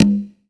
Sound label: Open Conga, percussion; DRY; MARKYDRUMS